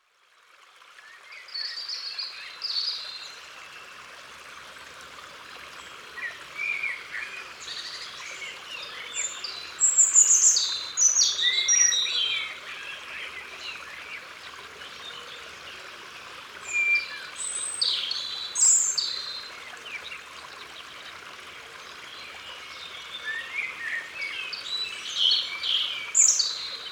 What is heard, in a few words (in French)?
Rougegorge Familier; Ardeche; Concerts Naturels De l'Ardeche